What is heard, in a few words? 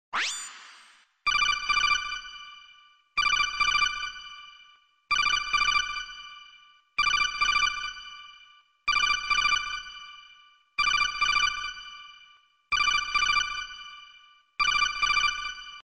metal gear solid codec